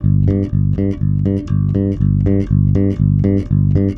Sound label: 110 Pop Basic Bass G, E-Bass; Pop Rock